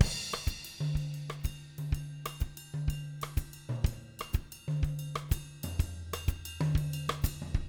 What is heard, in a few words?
124 LATIN, Living Drums; Sampleheads; Peter Erskine